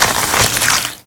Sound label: squish flesh